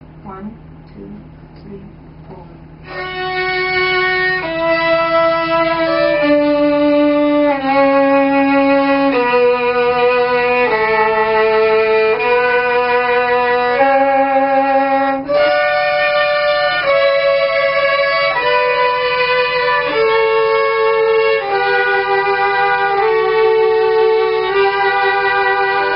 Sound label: Canon, violin